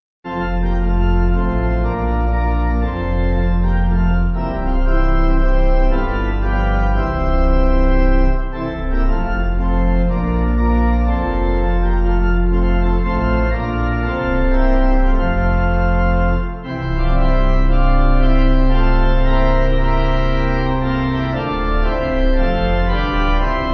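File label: pipe organ hymn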